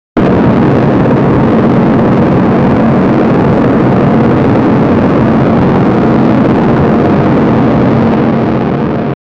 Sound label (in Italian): accensione silenziosa e m, launcher